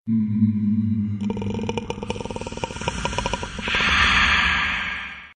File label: Fright Text